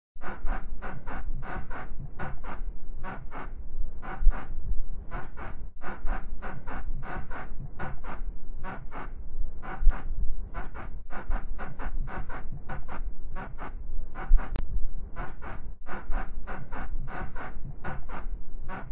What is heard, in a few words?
Bed Squeaky Sound Effect